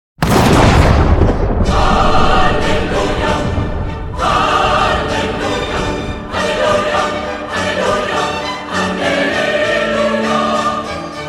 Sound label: thunder